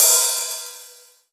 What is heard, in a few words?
Ride, Cymbals; Vengeance Samples; VEH2